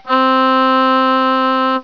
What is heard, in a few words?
overtone